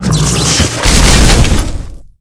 mech ape lord attack, monster

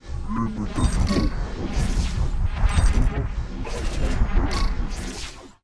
idle, monster

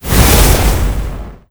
gate deth

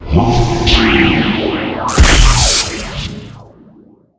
mummy mage attack, monster

